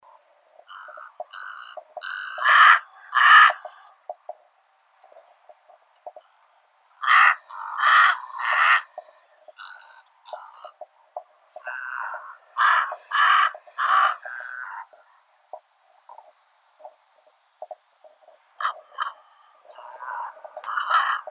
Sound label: voron pustinnii corvus ruficollis